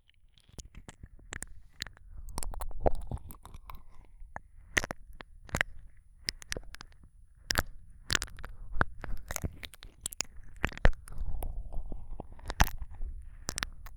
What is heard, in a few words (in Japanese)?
耳舐め, ASMR